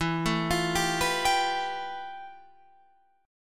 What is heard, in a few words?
F Major Sharp 11th E